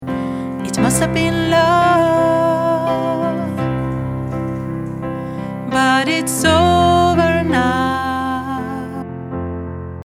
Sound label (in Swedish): sopran